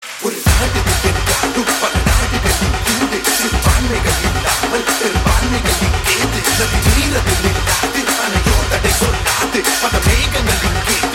Voice of Unity Ringtone; Maanaadu

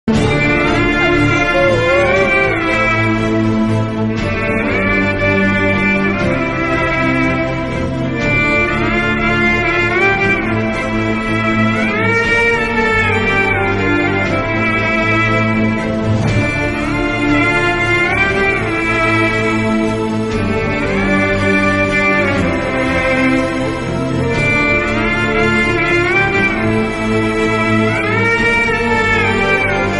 Sad BGM Ringtone; Colour Photo

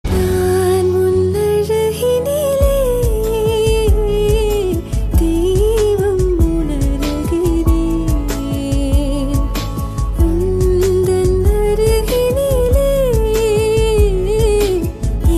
Female Version

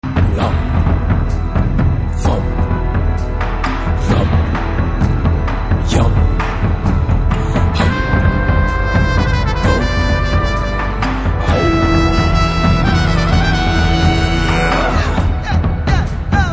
BGM Theme Ringtone; Mahaan

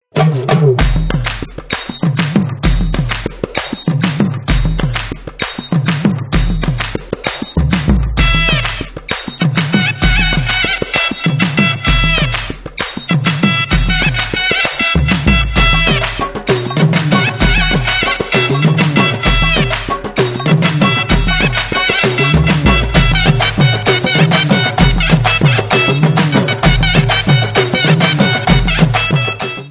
Folk Instrumental Ringtone

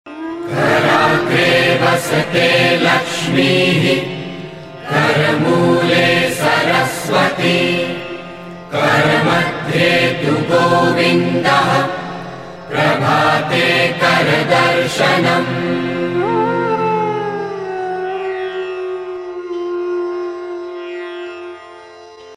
Morning Shloka Ringtone

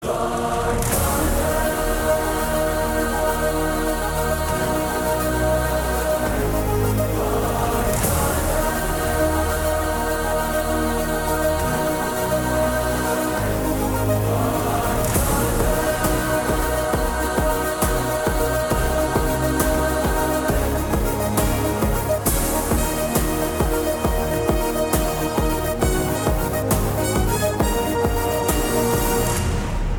Telugu Motion Poster Ringtone; God Father

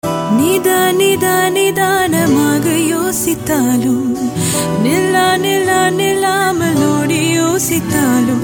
Female

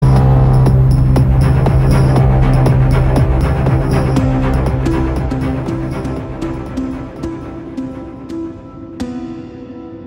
BGM Ringtone; Check Movie First Glimpse

